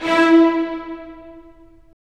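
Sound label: STR, strings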